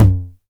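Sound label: 909 low tom tape; Goldbaby Tape808 & Tape909; The Tape 909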